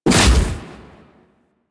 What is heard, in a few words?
Shield Knock Down; DE; Warframe; Garuda